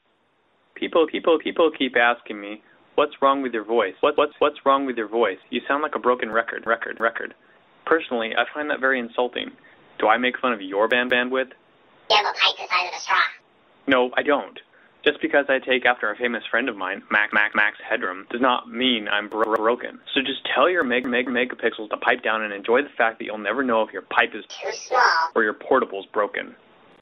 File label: music